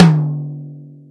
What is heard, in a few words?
high tom drum single hit d key